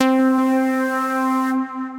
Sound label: Lead, Synth Lead; Vibrations by ModeAudio; Raindrop Lead